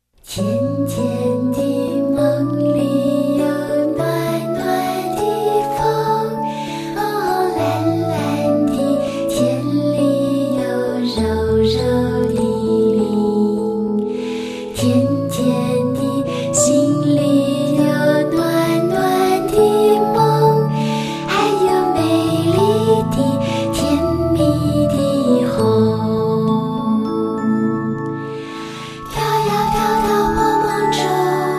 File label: rainbow song